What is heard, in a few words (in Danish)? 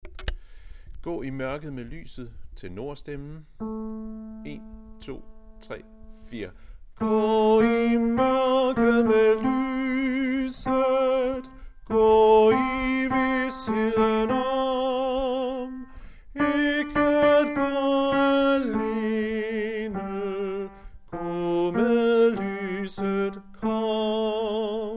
tenor; harlev koret